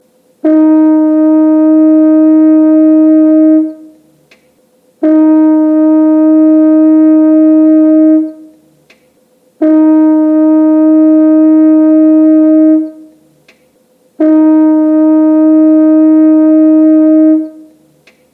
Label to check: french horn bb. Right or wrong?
Right